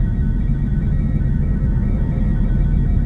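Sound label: console loop; movers objects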